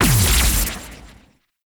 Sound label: Status Ability Hit; Warframe; Warframes; Helminth Abilities